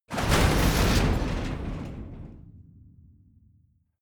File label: metal long pieces, industrie wirtschaft metall